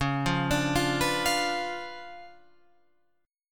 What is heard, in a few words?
Db 7th Flat 9th, Guitar, Open F; Db7b9 arpeggiated; JGuitar